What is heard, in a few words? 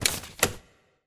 newsroom tile flip